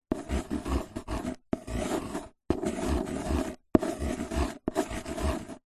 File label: office handwriting pencil draw on cardboard box